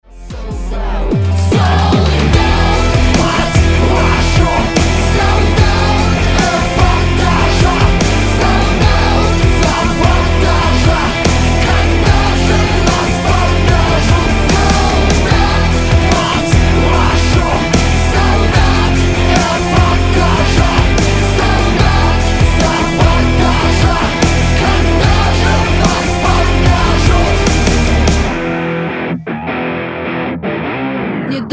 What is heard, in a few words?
TRAP ROCK